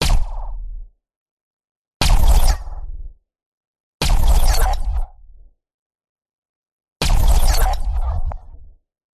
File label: science fiction laser shots bursts punchy; audio mangler